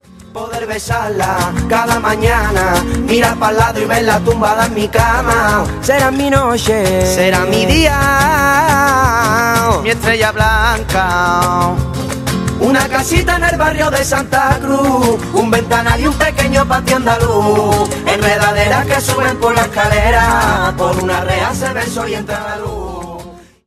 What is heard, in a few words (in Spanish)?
Fondo Flamenco